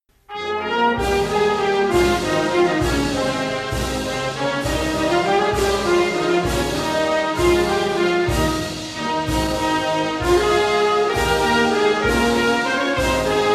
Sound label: national anthem